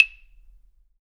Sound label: CLAVE HI FF; Big Fish Audio; Ross Garfield Drums VOL-2; GM PERCUSSN